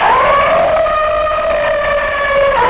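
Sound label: LAST SCREAM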